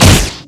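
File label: Se Battle Bow Critical; Etrian Odyssey I HD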